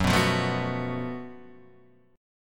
F sharp Minor Major 7th Double Flat 5th, Guitar, DADGAD; chordshape; down